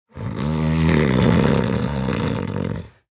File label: Snore, Male snore; (human voice); Snoring; VoiceBox